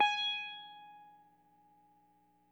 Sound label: EL SITAR SFT; Quantum Leap - Guitar & Bass